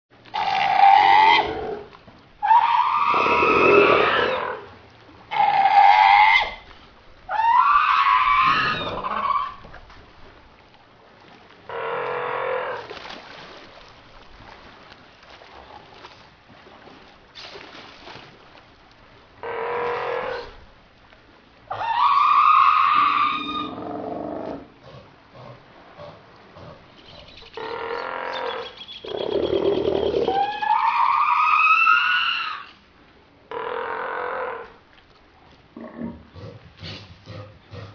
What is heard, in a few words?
fur